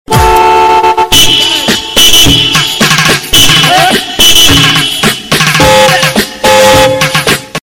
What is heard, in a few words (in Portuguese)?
toques de buzina no celular